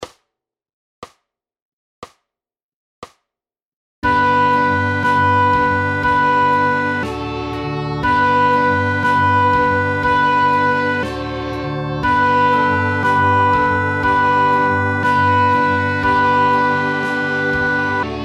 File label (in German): first song floete